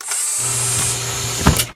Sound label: sphere door retreat